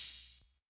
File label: Open Hat